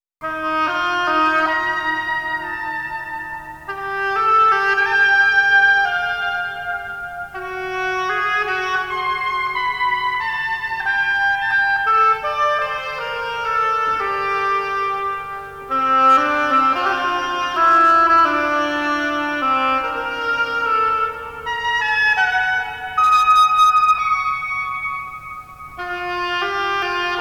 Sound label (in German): Variationen - Oboe; Variationen über Der Mond ist aufgegangen